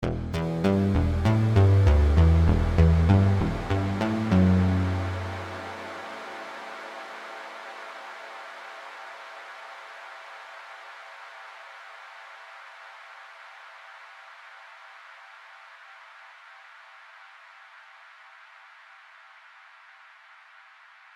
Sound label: Eventide SP2016 ST Room Modern Decay; 100s